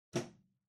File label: Wall Outlet Far Wall Plug In Hard